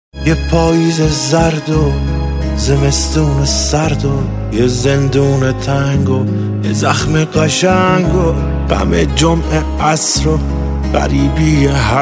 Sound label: Shahrzad Ringtone